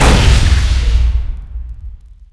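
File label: tank attack; quake2; railz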